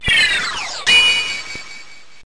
Magical Leaf; pokemon reborn e19 2022

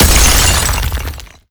Crystal Explode; Nicktoons Nitro; arcade

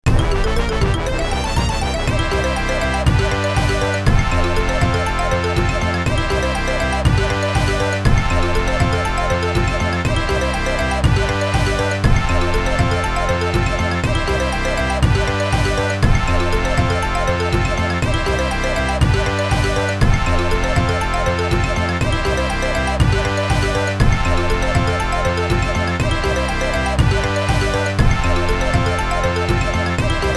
DK Jungle Court - Win; mario power tennis unofficial soundtrack gc wii gamerip 2004

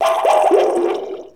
lose heart; SpongeBob Diner Dash

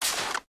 Raygun; criminality

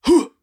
miner attack

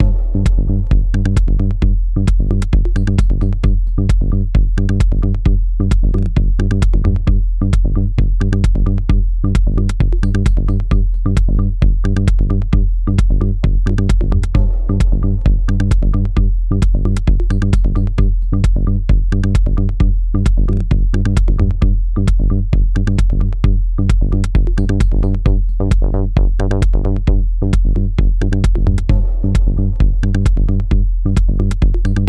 Panic Loop; pang adventures

